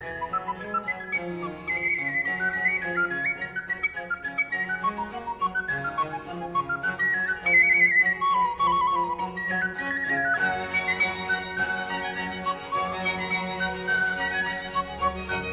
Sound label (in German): concerto fuer sopraninoblockfloete streicher und basso continuo; concerto c dur rv 443; allego molto